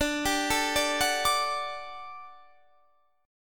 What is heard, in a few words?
G Major 7th Suspended 2nd D